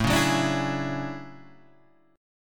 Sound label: D-Major Sharp 11th, A